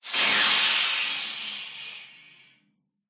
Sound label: ambient steam rush; ambient air; Half Life Alyx